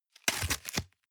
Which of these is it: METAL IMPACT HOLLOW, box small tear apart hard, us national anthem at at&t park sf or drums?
box small tear apart hard